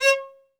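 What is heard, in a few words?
solo violin, strings; ILIO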